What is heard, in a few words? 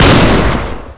ak47 fire